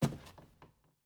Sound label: Footstep Wood Stairs